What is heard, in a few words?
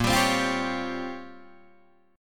A sharp Minor 6th Add 9th A sharp down Guitar Open D, chord